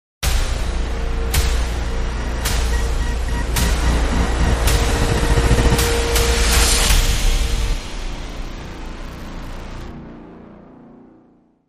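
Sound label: Dramatic Sting, No Orchestra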